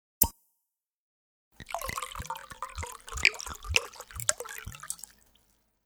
Wine Bottle, Uncorking a bottle and Pouring into a glass.; Objects